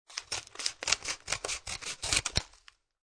Scissors Cutting Thick Paper, Foley, Scissors Cutting Through Thick Paper